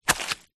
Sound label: footstep single walking wet forest floor; foley; zapsplat